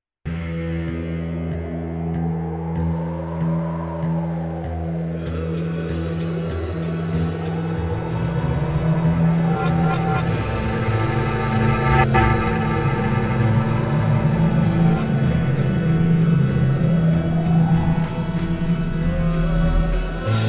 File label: rain